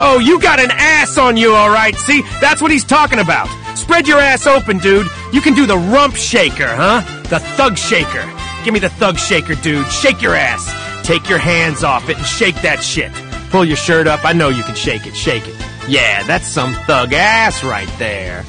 thug shaker; goku

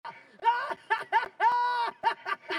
male aahhahahaaa

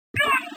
grunt

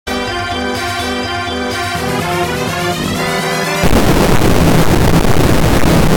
nice clown music

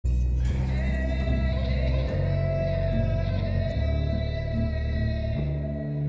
ghoul yodel

